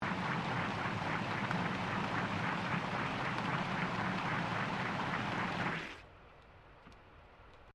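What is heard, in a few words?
Boat Custom Restaurant Boat Large Engine Shutdown Submerged; Resteraunt; Boats (Sound Effects:Vehicles)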